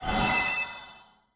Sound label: projectile; Smite; Tiamat